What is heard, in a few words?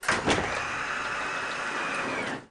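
back door open, volvo 9900; Freyfurt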